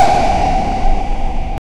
a blackhole vortex that

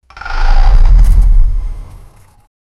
the wooden door creaks; doors

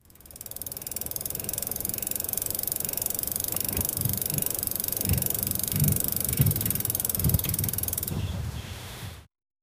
riding a bicycle

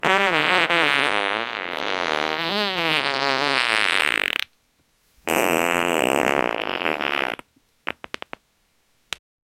juicy fart sound coming

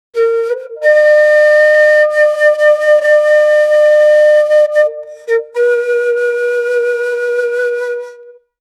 egyptian flute

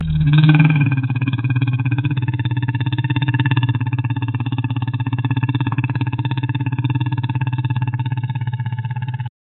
dragon singing a happy

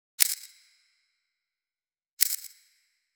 sound effect of a soft, photography

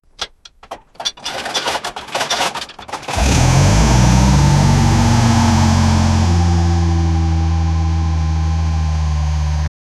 old 1958 chevy bel air, automotive

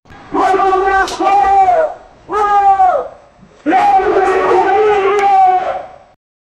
elephents fight with lion, safari

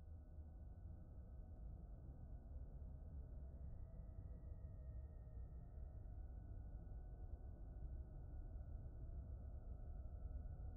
horror movie sounds with

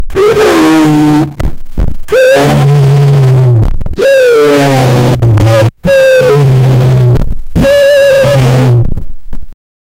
absolutely horrifying lou, ear piercing

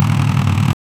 steady direct drive two s, kart

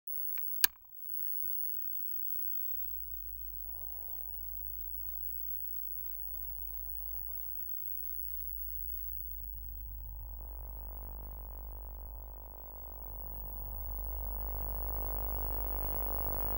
create a soundscape for t, powering on

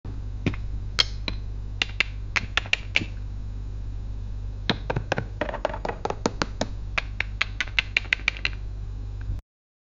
death sound in chess

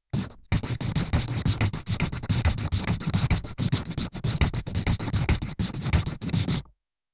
marker drawing seamless, writing

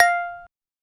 a clean dry audio recordi, single note

